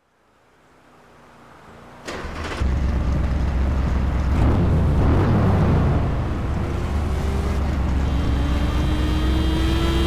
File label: chevrolet camaro z28